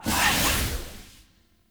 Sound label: Trick Ghost Spawn Burst; Enemy; Abilities; Characters; Circade